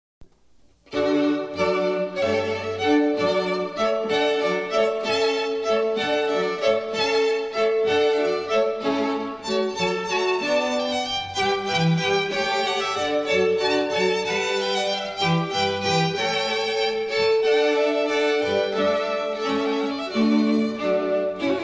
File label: hornpipe, Quartet